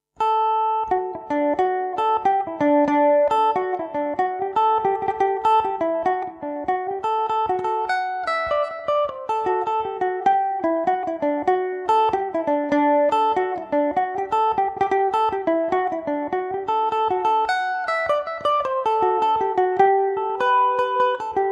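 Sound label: D mixolydian revelation, Irish mandolin; besom in bloom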